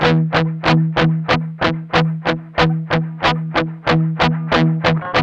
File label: med rock 8th, guitars; Spectrasonics; Hans Zimmer Guitars VOL-1; 102-MED ROCK